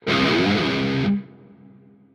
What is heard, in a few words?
spin guitar; smite; chaac